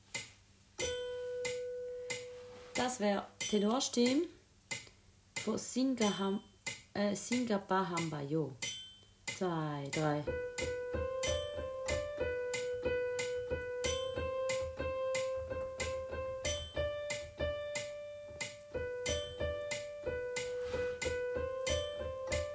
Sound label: Tenor